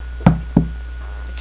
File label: knock